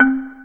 XYLO LO

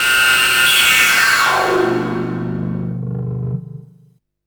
Smart Bass, Drum & Bass; Fluid Dynamics

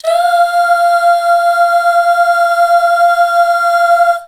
DUUH FM L, Hallelujah, BACKGROUND

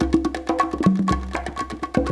ECHO LOOP, PERC RUN; Crypton - DrumBase5000

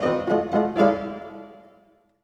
Orchestral Loops; 128 VERTIGO

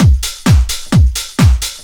drum 130 trance

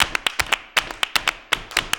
120 CLAPS

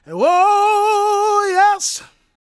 GOSP MALE, Vocal Gospel; Vocal Planet Vol1 Gospel

